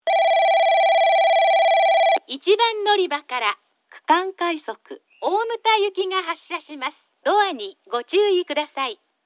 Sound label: kurosaki regional rapid omuta, portable recording; 1番のりば発車放送（区間快速 大牟田行き）